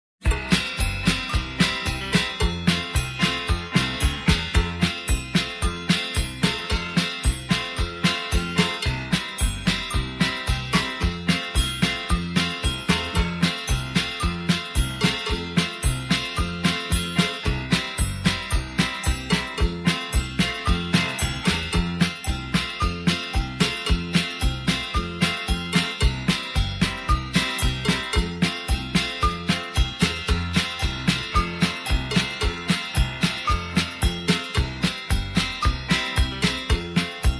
plain ole patter; square dance music

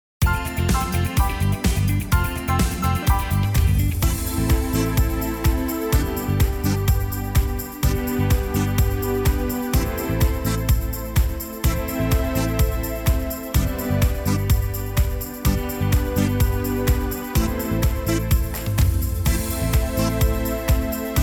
prince patter rhythm track, square dance music

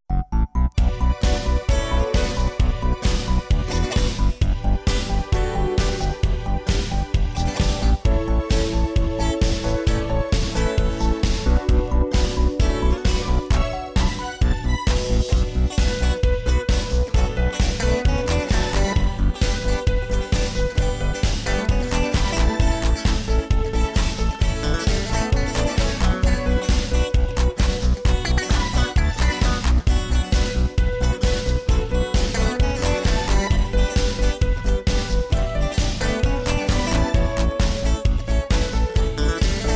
square dance music

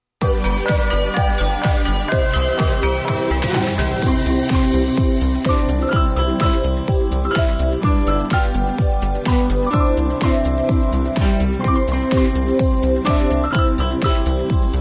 no bells, square dance music

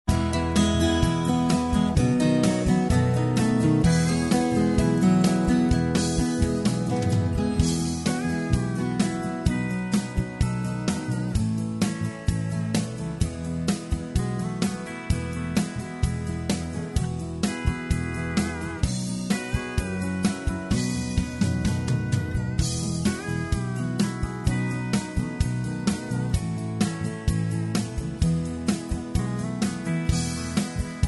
no melody, square dance music

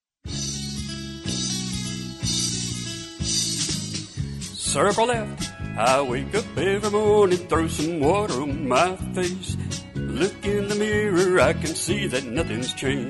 vocal; rainbow logo; square dance music

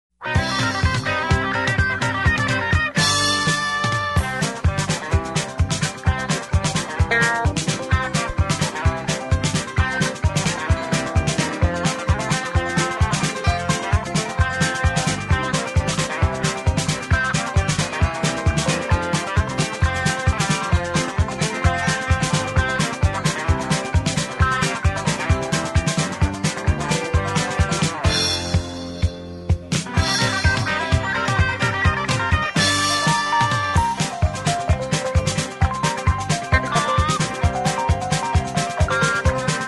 square dance music; c bar c

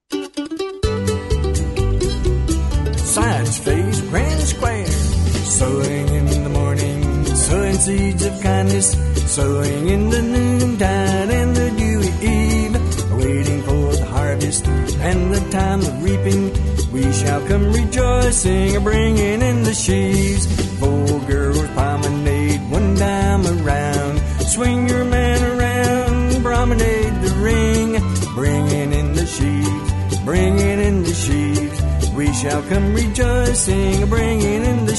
vocal, square dance music

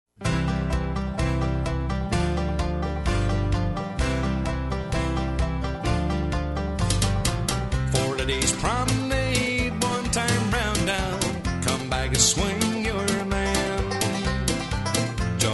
vocal, square dance music

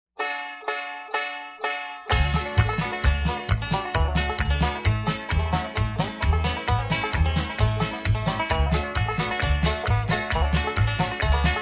hand picked, square dance music

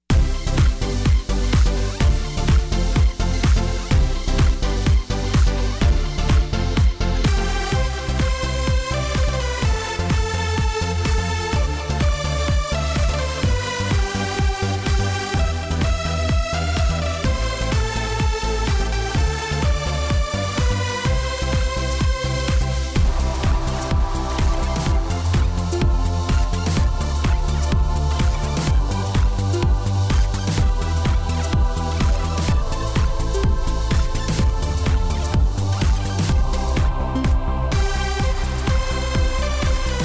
christmas patter, square dance music

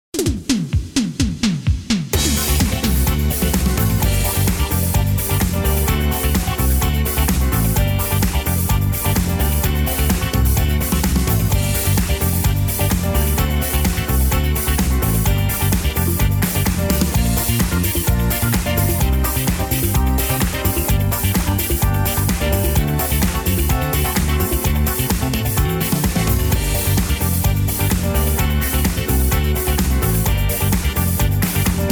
fur elise patter rhythm track, square dance music

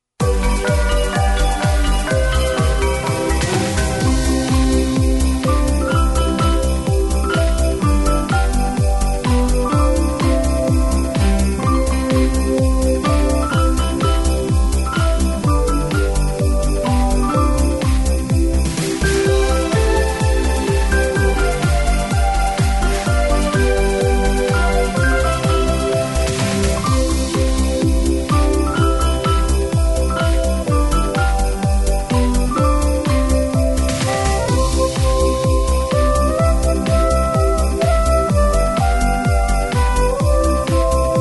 oh christmas tree with bells, square dance music

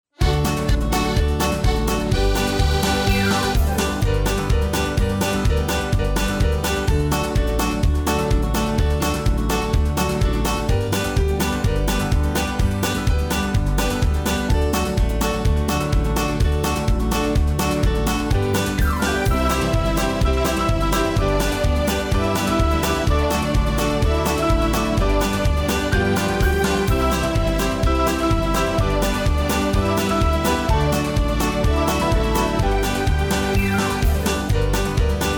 rhythm track, square dance music

